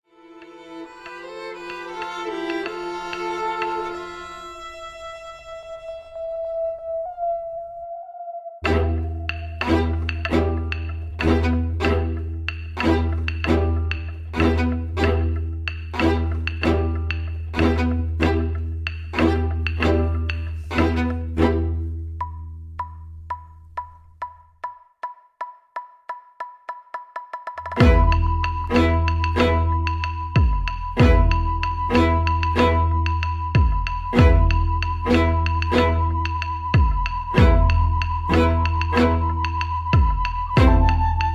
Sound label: Electric Klezmer Rhapsody